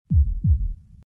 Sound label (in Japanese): 心臓の音